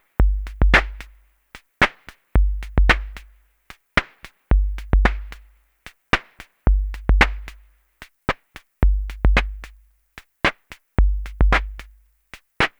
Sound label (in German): Snare Trap Snare Shape und Delay